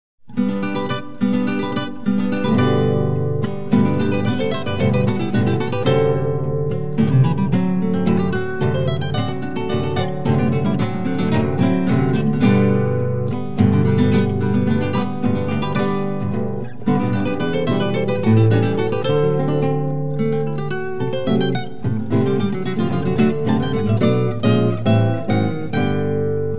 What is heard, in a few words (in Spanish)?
Cueca del violin; Tango et du Folklor Argentin; guitare SOLO